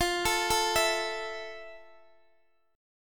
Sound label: F Major 7th, Guitar, Open D; x,x,15,15,12,14; down; chord shape